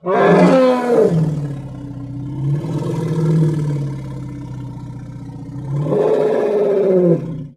Elephant Trumpet

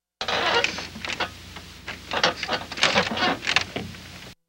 Bed Squeak Up And Down x2; bed movement

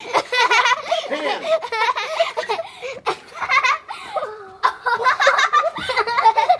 Children Giggle Cute

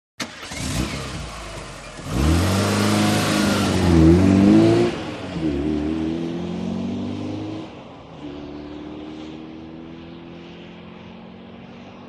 Aston Montego On Away

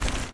Medium Footstep

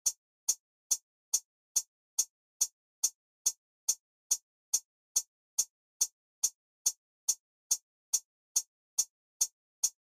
Alarm Beep Pulses

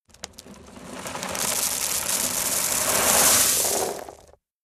Gravel Pour Dump End, rock exterior

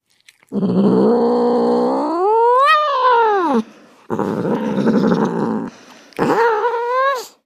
Cat Domestic Growl

